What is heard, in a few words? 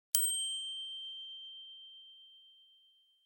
Notification Salient Haptic; iOS Sounds